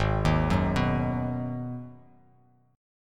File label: Ab Minor 7th down; arpeggiated; JGuitar